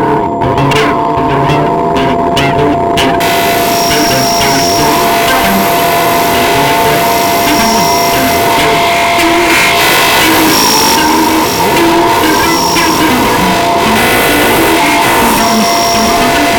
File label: Rimac Noise